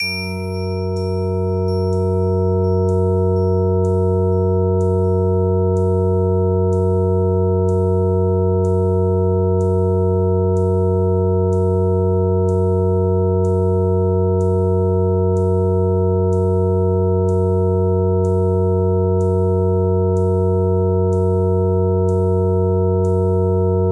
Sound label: Zen Dominant in Gb; Mike Cirillo; Musical Self-Actualization